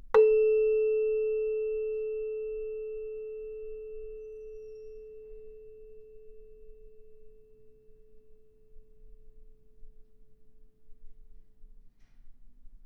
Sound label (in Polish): kamerton